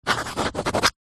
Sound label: office pen rollerball sign siganture on card